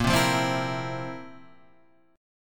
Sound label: F Major 7th Suspended 4th